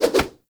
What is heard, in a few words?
Bayonet Melee Combo; Warframe; Tenno